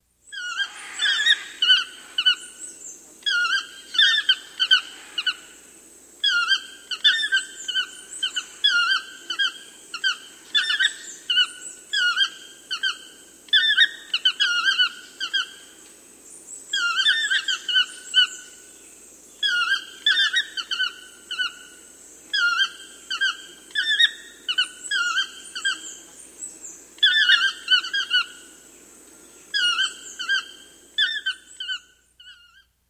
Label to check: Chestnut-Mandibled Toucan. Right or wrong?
Right